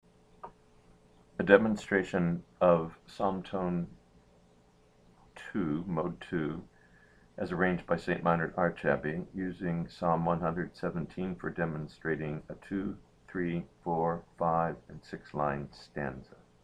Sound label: psalm tone ii